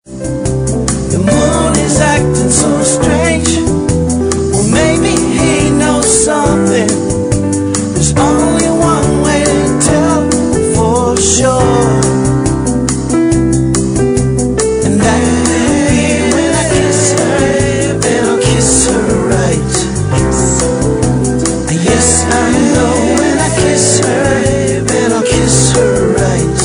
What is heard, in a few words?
Piano Version 140